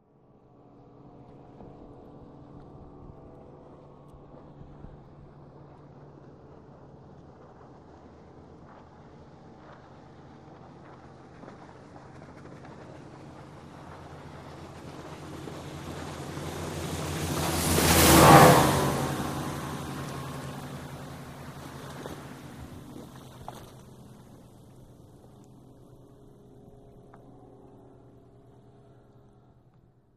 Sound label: Ranger Boat By Medium